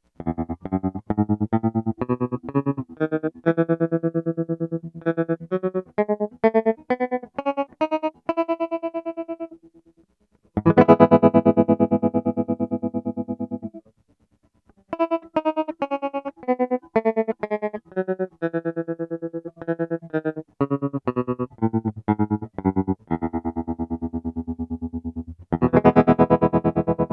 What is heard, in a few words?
Roger Mayer Tremolo Max G Major, guitars; Pedal Effects